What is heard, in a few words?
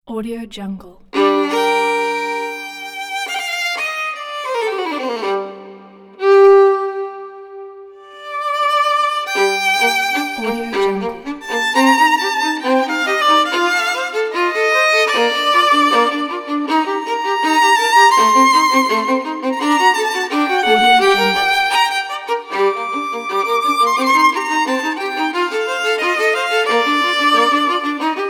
emotional classic violin solo